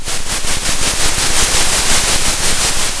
center, Sound of a train

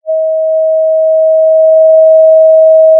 pop, Sound of a cruise ship horn

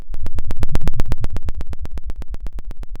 Sound of a dinosaur

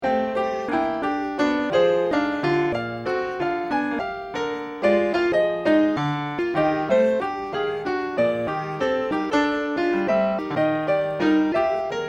scrambled short piano

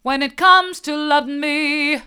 mid, vocal

